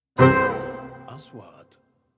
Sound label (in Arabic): إنتقالة كوميدية